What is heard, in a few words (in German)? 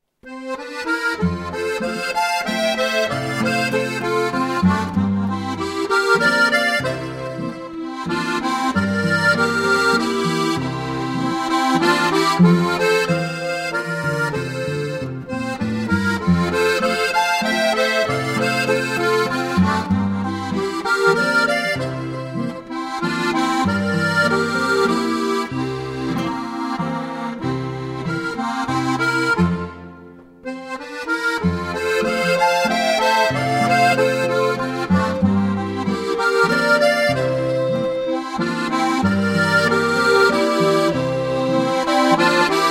Rauriser Landler